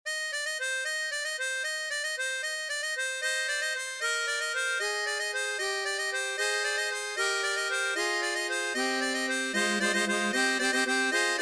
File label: melodica; shchedryk